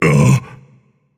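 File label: non-verbal surprised; smite; atlas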